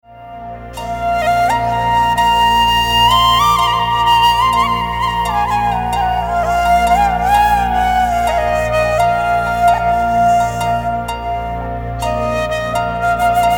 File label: Instrumental